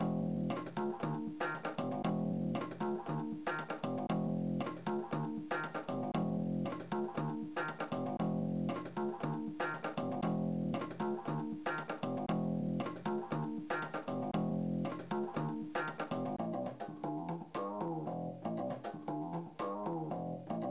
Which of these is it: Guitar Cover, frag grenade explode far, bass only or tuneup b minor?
bass only